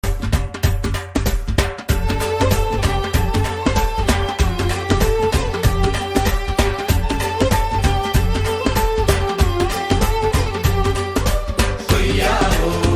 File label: evergreen malayalam song; kerala